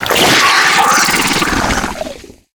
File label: Acid Barrage Shot; Lurker; Evolve; Gorgon